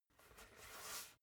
basketball spin turn in hands short quick; sport freizeit

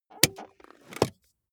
car bmw e46 interior handbrake release

